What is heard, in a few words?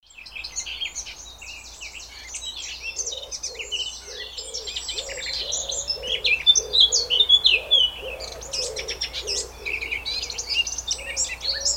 birds